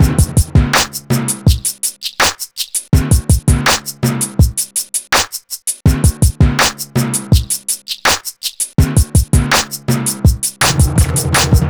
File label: E Coast HipHop Drums